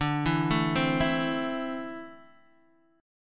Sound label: D 7th Suspended 2nd down Guitar Open D